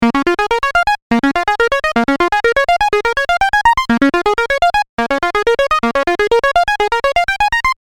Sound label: Arp Lead